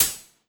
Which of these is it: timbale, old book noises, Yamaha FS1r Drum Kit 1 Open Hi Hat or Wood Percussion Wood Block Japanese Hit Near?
Yamaha FS1r Drum Kit 1 Open Hi Hat